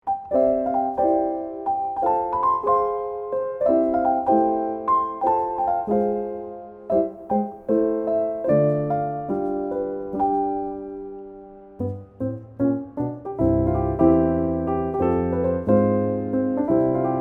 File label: happy christmas piano; Marché de Noël Végane 2025